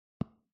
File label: Touchscreen